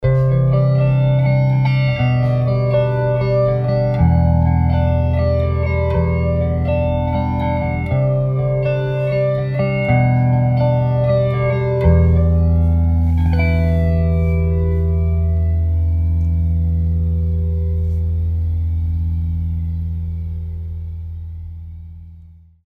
SDD2000 Flanger

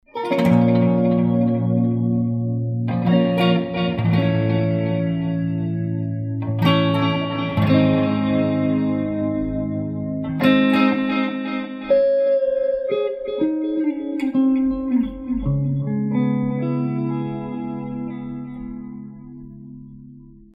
SDD2000 Echo

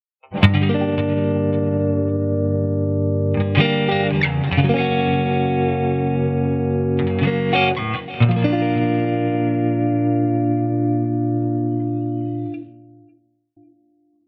clean tone

